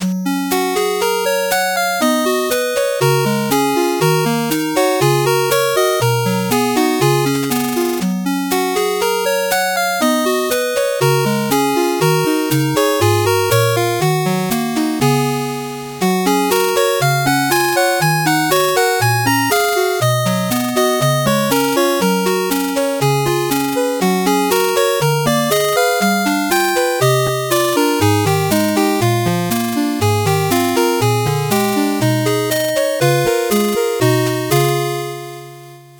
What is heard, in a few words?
8bit bgm fast